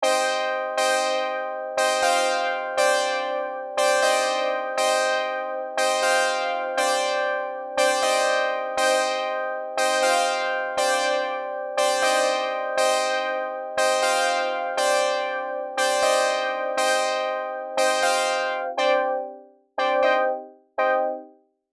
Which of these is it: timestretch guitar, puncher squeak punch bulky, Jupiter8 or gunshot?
Jupiter8